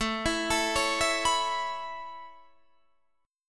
A Major Add 9th A Guitar Open D, chord

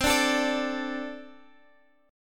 A Minor Double Flat 5th

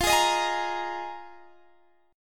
D sharp Suspended 2nd Suspended 4th F

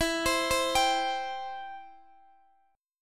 E Minor Sharp 5th, Guitar, DADGAD; arpeggiated; x,x,14,17,15,17; Em#5

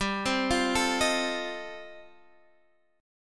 F 7th G, F7/G chord; F7/G arpeggiated

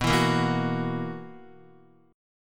B Major 7th Suspended 4th B down Guitar; BM7sus4; strummed

